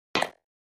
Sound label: Button Elect Hit Shim